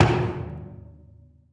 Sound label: footstep; Barotrauma; Barotraumatic; xanHuskmutantarmored